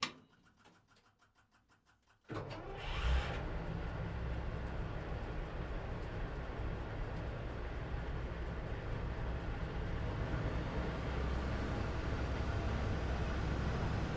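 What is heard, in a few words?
StuG 40 onboard start idle revs interior; Sonniss GDC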